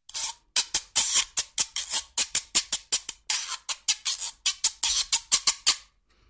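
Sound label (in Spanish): Güiro, Percusión; Idiófonos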